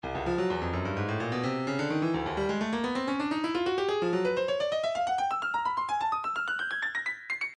stylus breakbeat midi files 128 bpm; Chemicalizer A

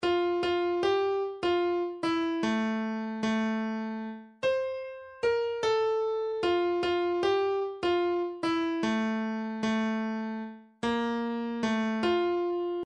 russian, midi

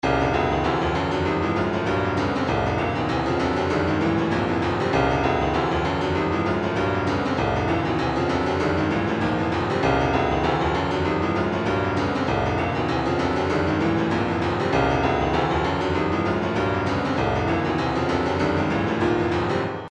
retro funk 098, midi; above average

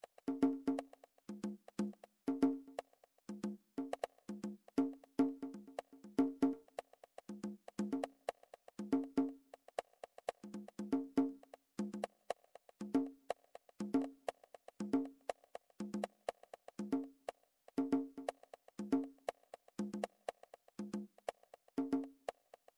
midi drums percussion; Midi Vol 4

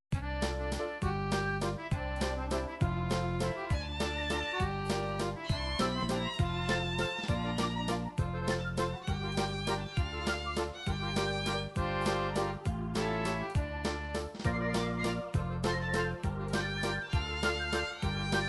russian ukrainian, midi

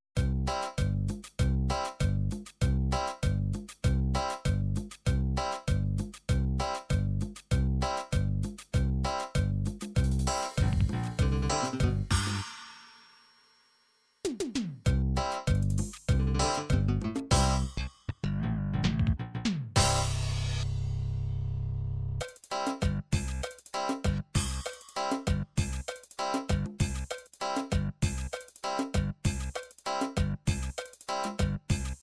latin cumbia, midi

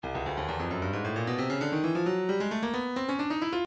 stylus breakbeat midi files 133 bpm drum n base; drummundbase

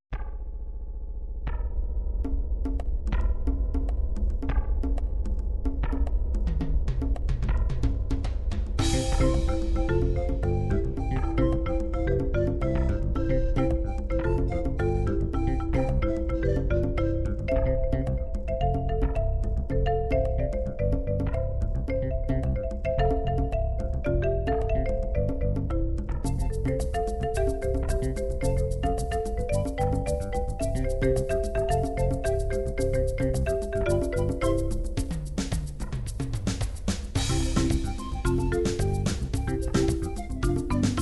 island drums, midi

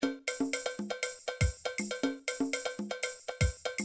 latin 2bar

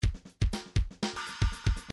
midi file fills funk fills funk fill

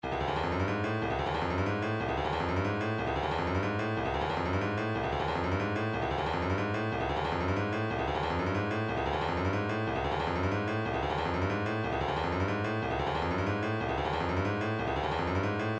vocal planet super fill, midi

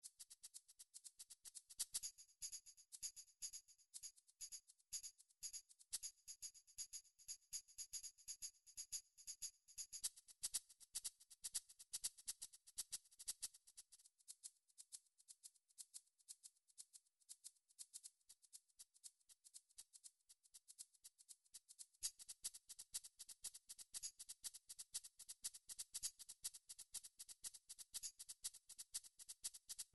midi drums percussion; Midi Vol 4

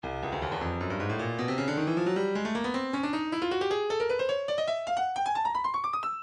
breakbeat midi files 140 170 bpm 155; Stylus; spasm

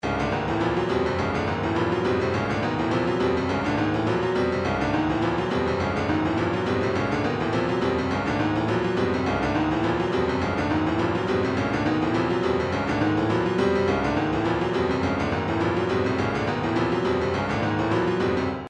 retro funk; the gang

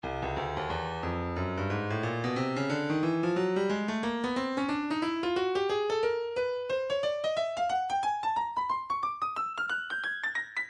breakbeat 090 bpm; Stylus; Nu Skool

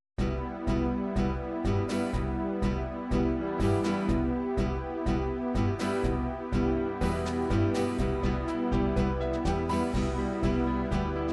psr9000 tango, midi

